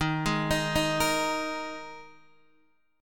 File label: D sharp Suspended 4th D sharp, Guitar DADGAD